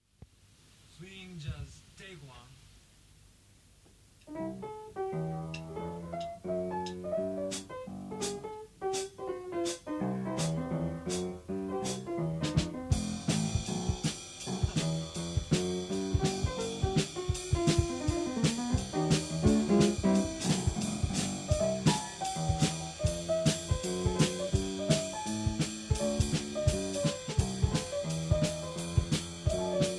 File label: swing